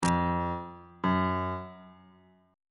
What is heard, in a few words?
e sharp 1st interval pitches on bass clef